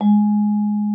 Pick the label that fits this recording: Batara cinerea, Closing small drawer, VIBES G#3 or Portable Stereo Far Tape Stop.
VIBES G#3